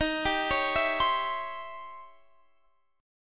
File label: E flat 13th Flat 9th E flat; arpeggiated